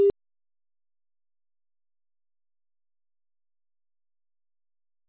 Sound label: Tone On Hold, IP Phones; Cisco; IOS; 7942G; English